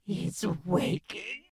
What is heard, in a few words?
hes waking; devour